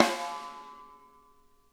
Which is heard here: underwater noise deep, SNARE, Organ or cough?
SNARE